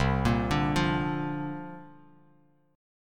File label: C 7th Suspended 2nd Suspended 4th C down Bass Standard; C7sus2sus4 arpeggiated